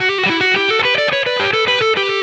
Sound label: Electric Guitar, Loops; Guitars